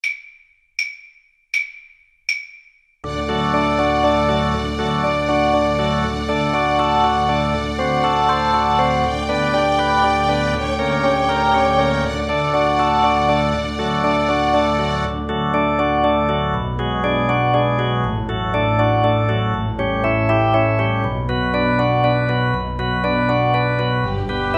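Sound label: schubert ave maria GUITAR karaoke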